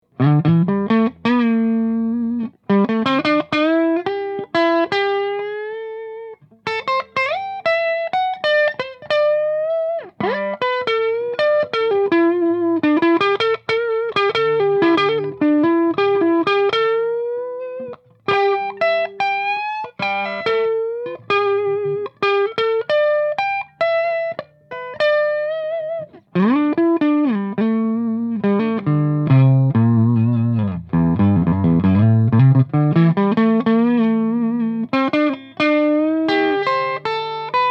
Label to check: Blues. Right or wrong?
Right